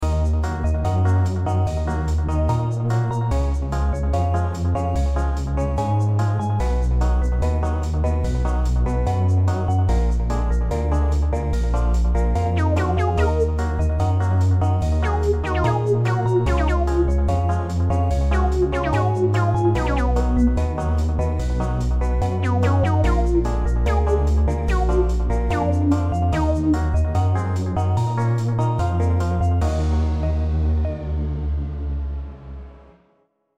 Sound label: elevator jam; DOORS but Cheap